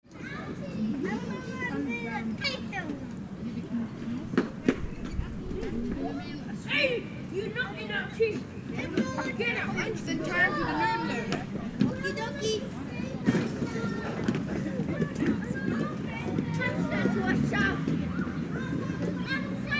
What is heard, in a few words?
Suburban playground with kids and people talking